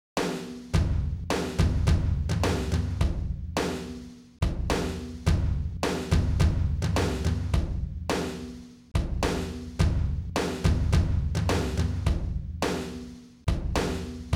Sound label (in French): batterie